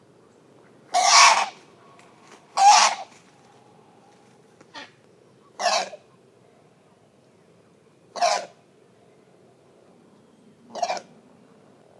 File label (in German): graureiher